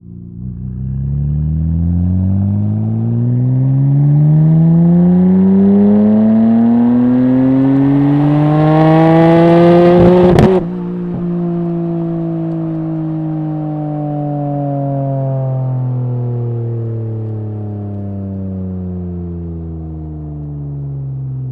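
Nissan R33 Skyline - onboard - RAMPS - Drive - EXHAUST - Left; Nissan R33 Skyline 1997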